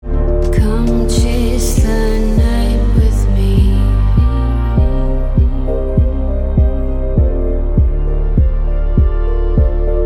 Downtempo Pop

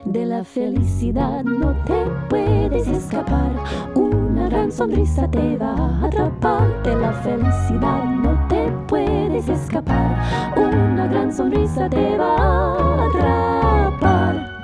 Happy Place Spanish

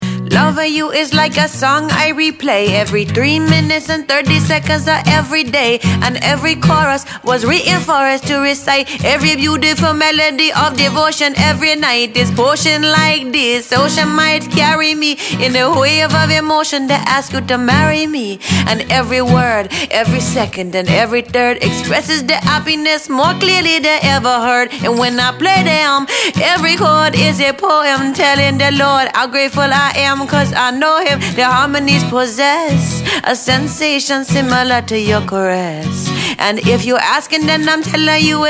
REGGAE; Internet Session, Los Angeles

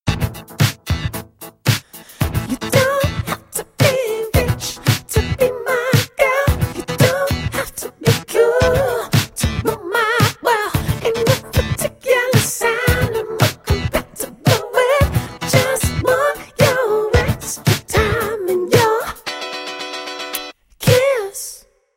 Pop falsetto

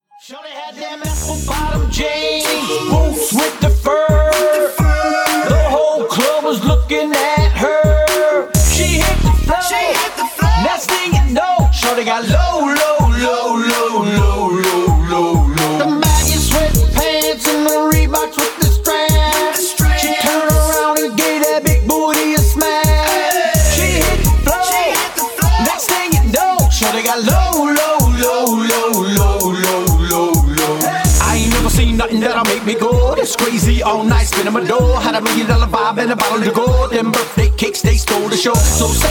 dance; Internet Session, Los Angeles

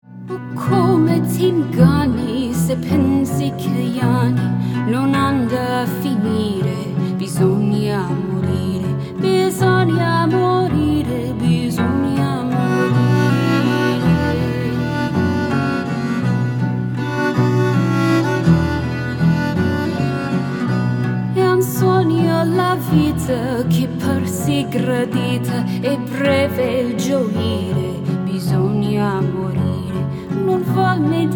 Italian Renaissance; Internet Session, Los Angeles

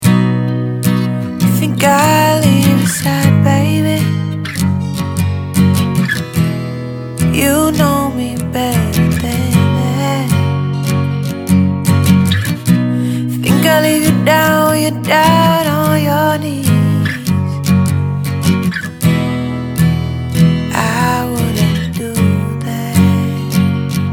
ACOUSTIC; Internet Session, Los Angeles

